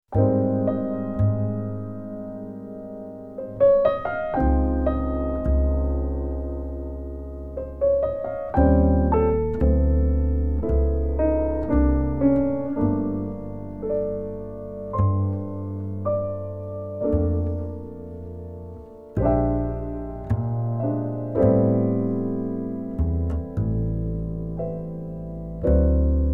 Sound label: minus Melody